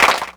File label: Clapbox Pitch 11; Ableton Foundation FreePacks